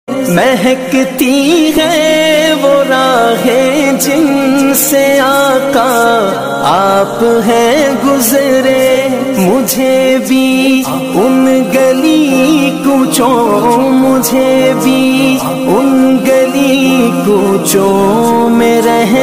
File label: Naat Sharif Ringtone